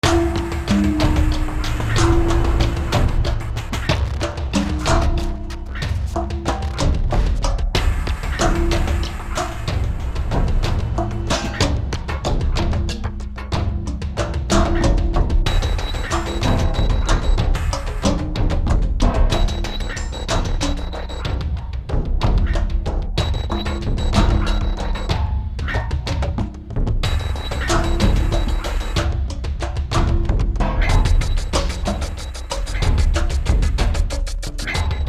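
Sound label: Battle Loop City Percussion; Fallout New Vegas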